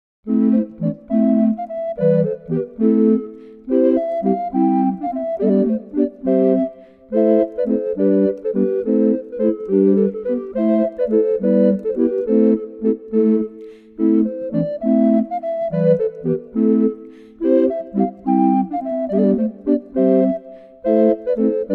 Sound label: Courante